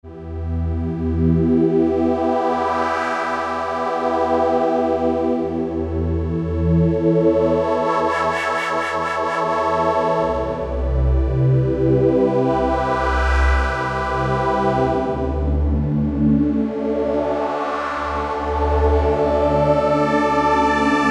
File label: Synthex, Generalmusic Equinox